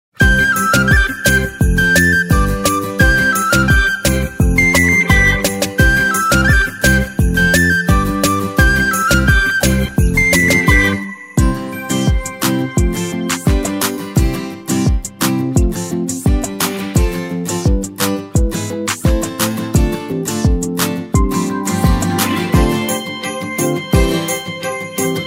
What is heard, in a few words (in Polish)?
wersja instrumentalna; Wersja instrumentalna do piosenki; karaoke; Krakowskie Centrum Artystyczne